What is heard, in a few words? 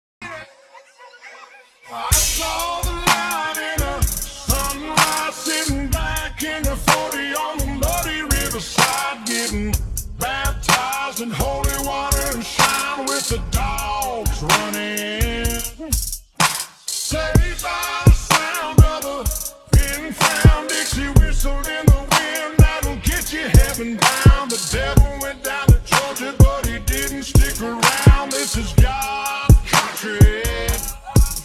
scratch track